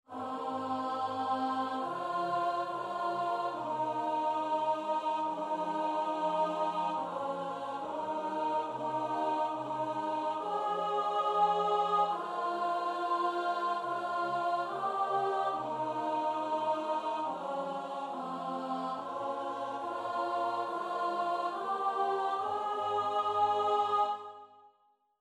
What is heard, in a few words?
Psalm alto